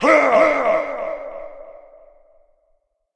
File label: shout vox; smite; god odin